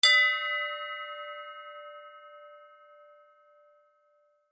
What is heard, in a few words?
bell